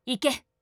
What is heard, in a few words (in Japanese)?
攻撃